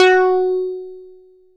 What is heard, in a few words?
MULTIBASS, Dance Mega Jungle Rave